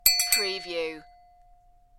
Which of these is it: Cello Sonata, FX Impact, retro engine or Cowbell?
Cowbell